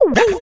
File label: beatbox, Kick Snare; Drum loops